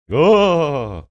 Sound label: making spooky sound